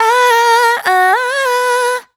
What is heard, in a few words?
riff Oh Woah Ya B, Dry Vocals; Raw Vocals; Slate Digital Omnivox Vocal Sample Pack